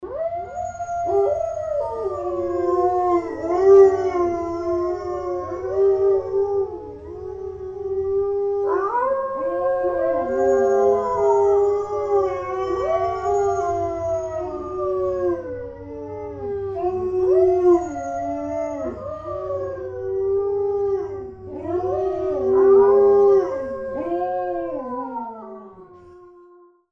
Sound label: wolf sound